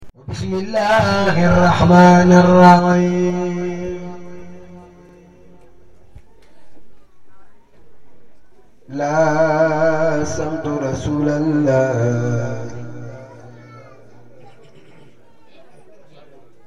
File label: live in a courtyard in Touba Senegal, African Field Recordings; Bruce Miller